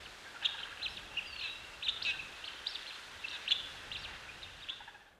crane chatter; ambient; anubis

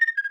ping alert negative; ui

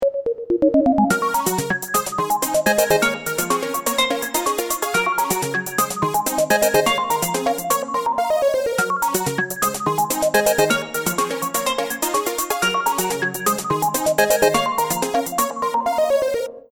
start round, music

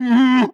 warning, zombie vox; creatures

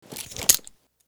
knife falchion draw; weapons; Counter Strike Model Pack; CS:GO

